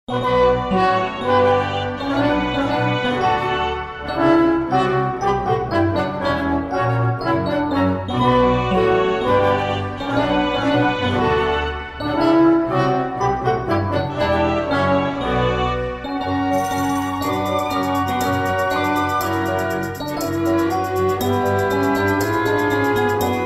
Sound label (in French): La marche des Rois mages instrumental